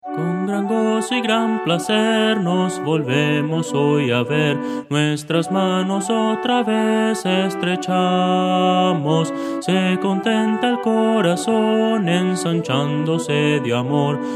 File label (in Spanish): melodia